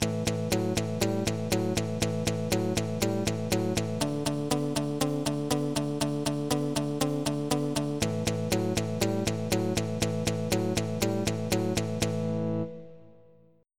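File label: mute guitar; Roland - An Invitation To The Roland World Of Music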